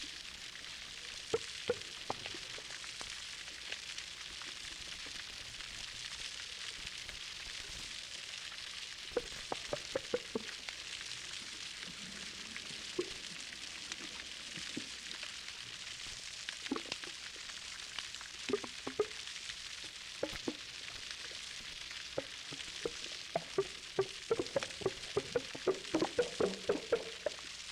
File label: Gray whale call